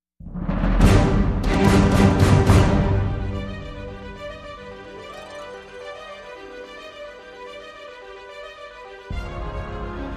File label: No Theremin